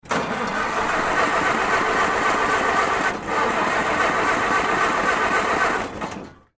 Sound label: Tractor Engine Start Stall Agriculture; Tractors; Industry; Blastwave